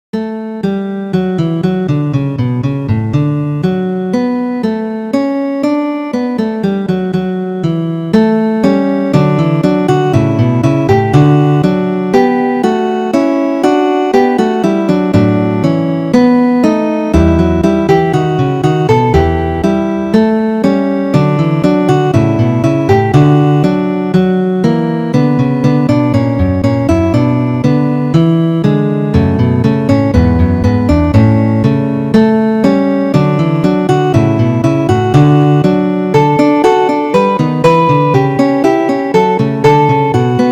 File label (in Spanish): guitarra duos; Ferdinando Carulli